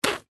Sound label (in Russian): zvuki plevka cheloveka